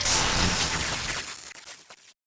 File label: arc titan spark; Titanfall 2